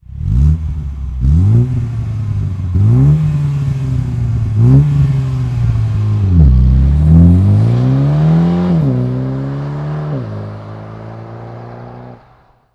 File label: Mini Cooper S F55-F56-F57 Axle-Back System; REMUS